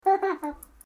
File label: baby laughing